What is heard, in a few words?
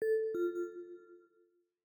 kds new order